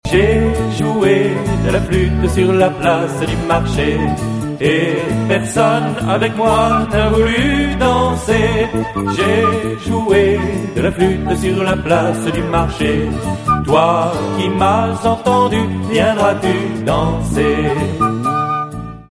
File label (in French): joue Flute